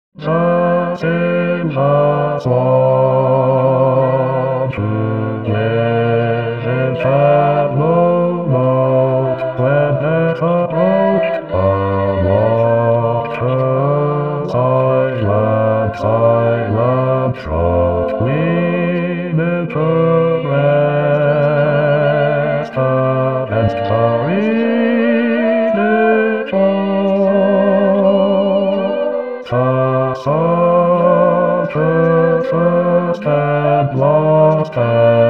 Bas; Silver Swan

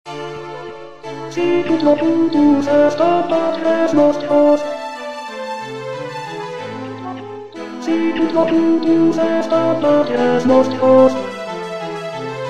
sicloc Ten

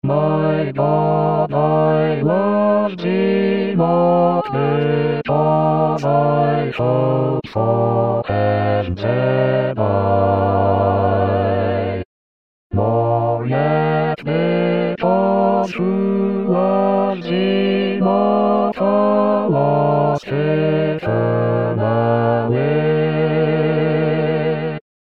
Hymn BAS

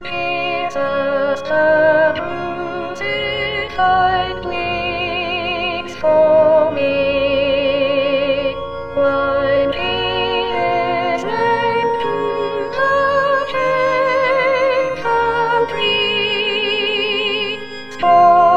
Jesus the Crucified Sop

